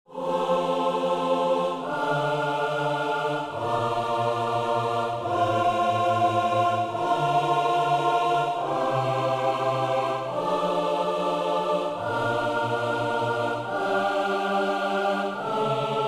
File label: Unfigured Bass